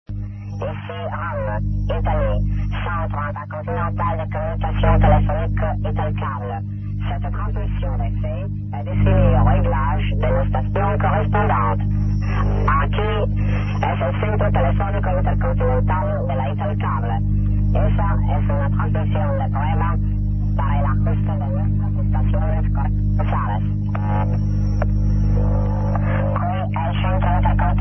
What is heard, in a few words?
usb